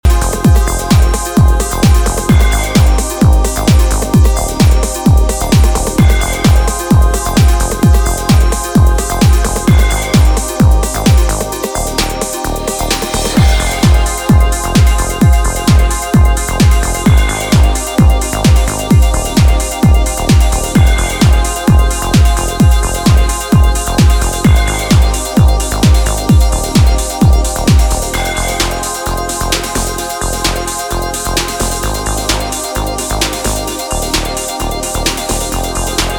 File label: 909 Voices